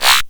blips, opl3

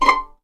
C6 PIZ MF, string; East West; The Ultimate String Collection

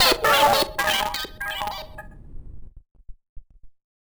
random synth failure, malfunctioning synth

